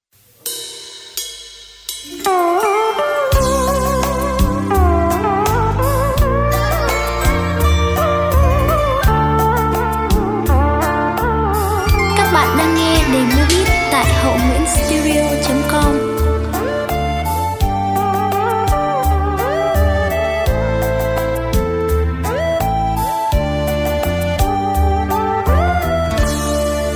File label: beat, playback